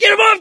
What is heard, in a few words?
scream while pounced, survivor; source engine; left 4 dead 2